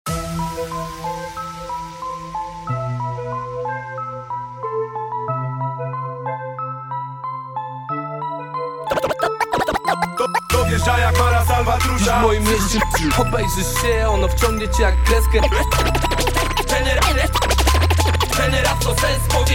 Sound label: Scratch Cut Intro Outro